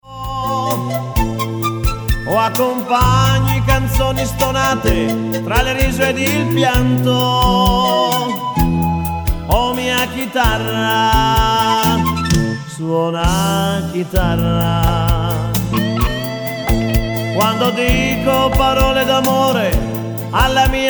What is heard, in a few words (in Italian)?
Suona chitarra